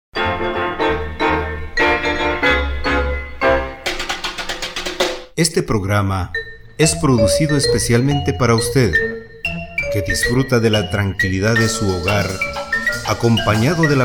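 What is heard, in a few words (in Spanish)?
spot presentación instrumental bolero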